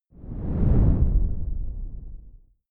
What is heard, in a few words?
whoosh simple low grainy; swooshes-swishes